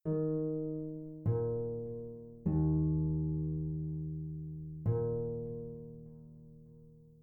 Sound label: game over, chiptunes